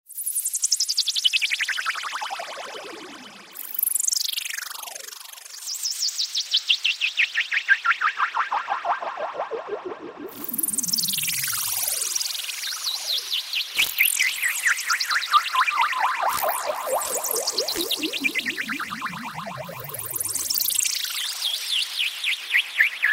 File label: INSECTRON, Twisted Special FX; Universal Sound Bank; Soundscan 14 Twisted Special FX